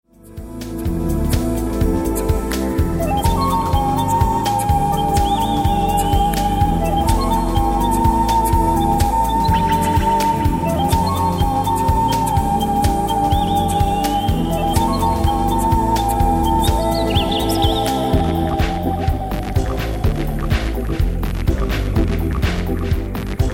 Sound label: Native Trance